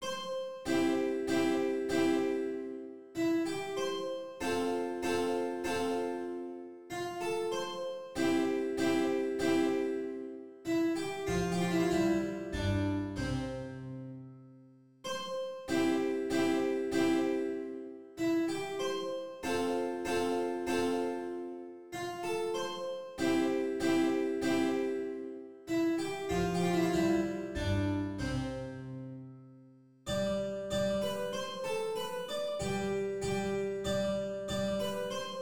menuet majeur

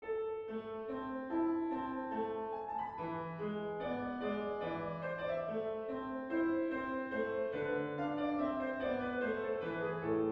sonate la mineur; cimarosa domenico

